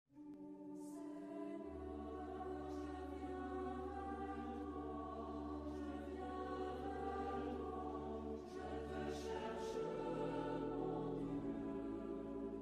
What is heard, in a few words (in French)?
JINGLE O SEIGNEUR JE VIENS VERS TOI chante